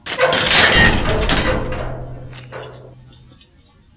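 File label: metal open; Mafie 1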